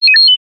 science fiction robot chirp vocalisation short

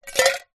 tin can lid inside empty tin shake; foley

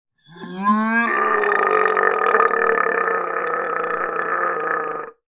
horror monster zombie male groan; sound effects one

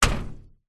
impacts metal cover light hit

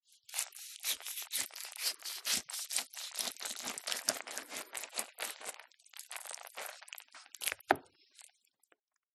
CLEAN SLICING; PMSFX

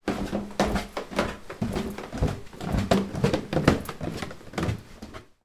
footsteps down wooden staircase; zapsplat

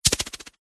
warfare knife throwing weapon spin fast air

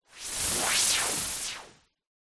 magic wand whoosh; zapsplat

